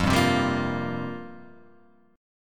F Suspended 2nd Suspended 4th F down

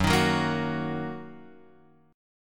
Gb 6th Gb down Guitar Open F; Gb6 strummed; JGuitar

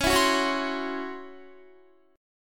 C sharp Minor Major 7th Double Flat 5th Guitar; C#mM7bb5; strummed; JGuitar

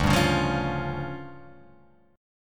D Minor Major 7th Double Flat 5th, D

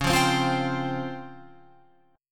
C sharp Major Sharp 11th, Guitar Open F; C#M#11; strummed

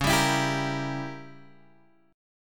C sharp Diminished 7th C sharp down Guitar Open F; C#dim7; strummed

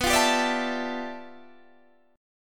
G 13th B, chord

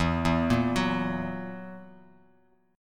E Suspended 2nd Flat 5th E down Bass Standard; arpeggiated; JGuitar

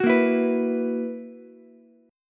Gb 11th, Ukulele, C6; Gb11 strummed; JGuitar

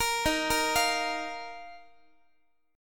Eb Suspended 2nd, Guitar; Ebsus2 arpeggiated; JGuitar

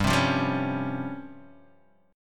C sharp Major 7th Flat 5th, chord

